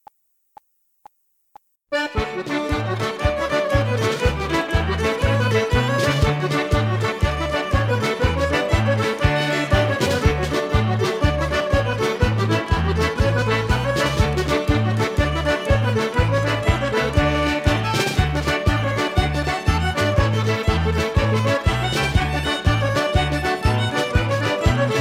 Irish Ceili Jigs Medley D, backing track

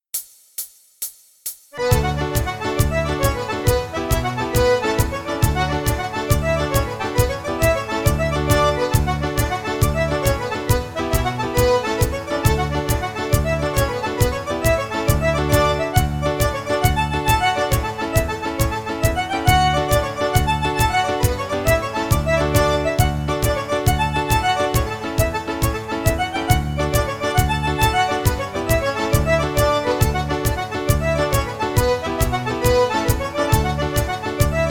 Traditional Irish Slide D

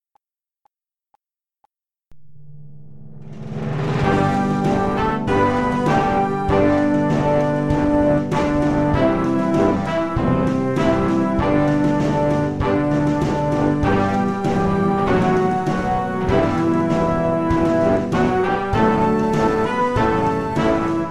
Irish National Anthem; backing track

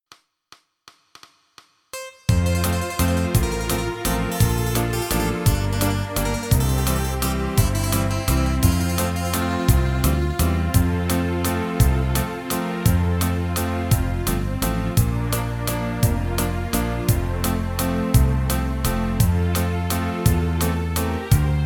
F, backing tracks irish country pop

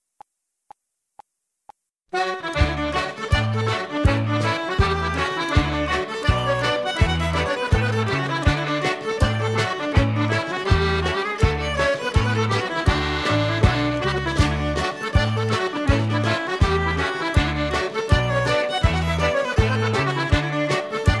Irish Ceili Hornpipe D; backing track

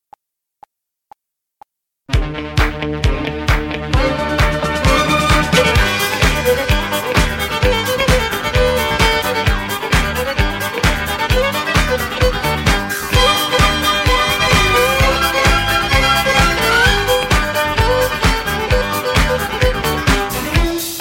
Party Song Country G, backing track

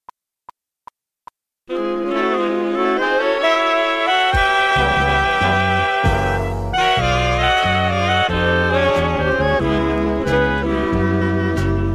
Ballroom 40s Swing, A; backing track

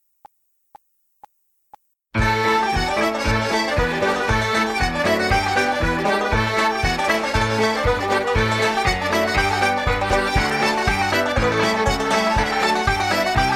Traditional Irish Reels Medley A; backing track

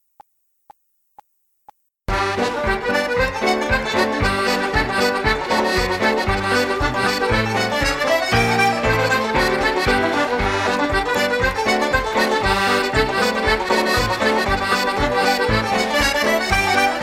Irish Ceili Reels Medley; backing track

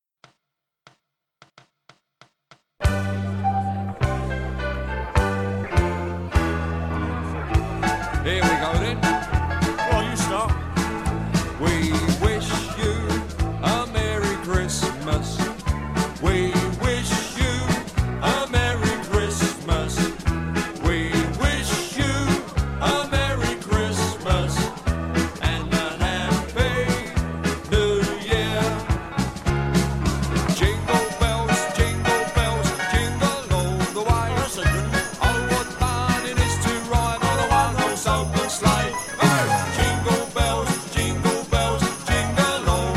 Christmas Singa a long Medley, mp3 backing track

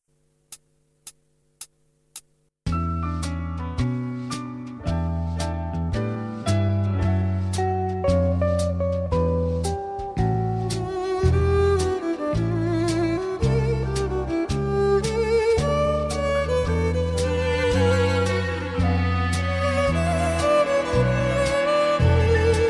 Ballroom Slow Foxtrot D; backing track

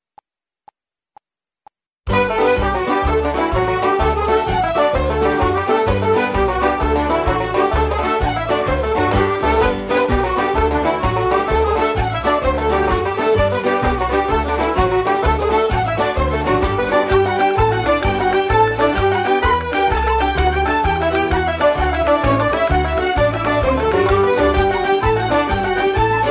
Traditional Irish Jigs Medley G; backing track

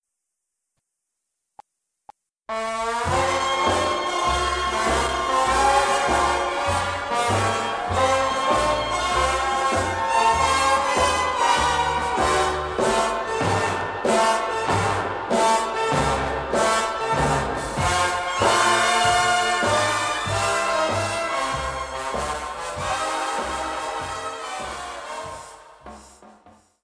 Wedding Stripper Music; backing track

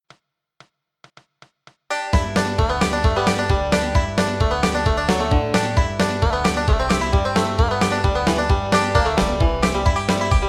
Bluegrass Banjo fiddle G; Get Along Home Cindy

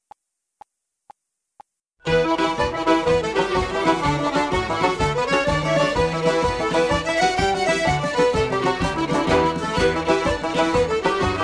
Traditional Irish Jigs Medley Em; backing track

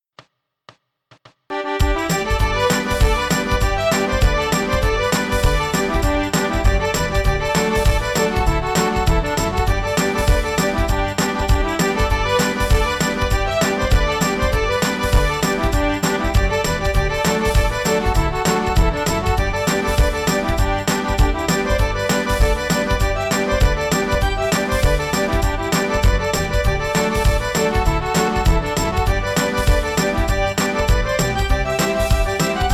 Bluegrass fiddle G

